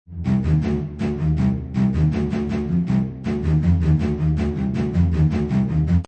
bass staccato Cm 160, Orchestral bass loop staccato Cm 160 bpm